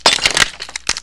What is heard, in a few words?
ice